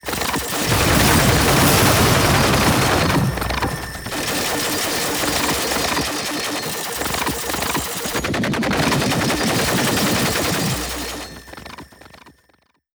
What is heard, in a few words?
battle laser; Cartoon - film effects